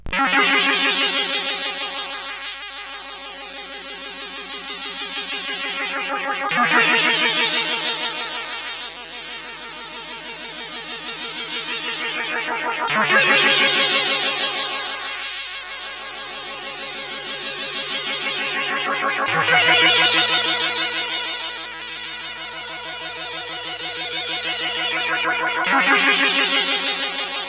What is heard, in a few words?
Ambient Music; CSG8580R5; hvsc